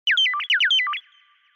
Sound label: bird song